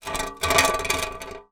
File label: industrial shovel metal drag hard ground; zapsplat